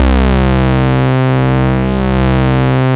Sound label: BS MINI, Analog Bass, Synth Bass; Roland LCDP02 Guitar and Bass